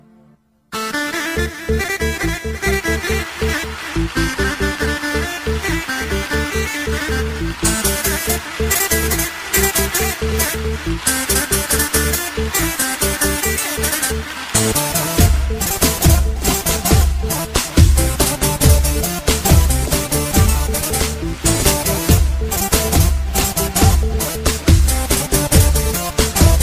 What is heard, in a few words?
Balkan Instrumental